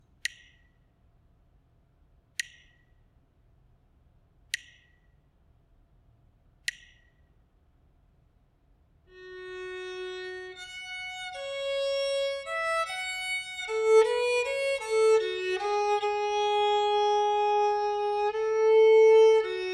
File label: melodic error detection; ear training